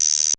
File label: 78 GUIRO